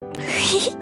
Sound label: giggle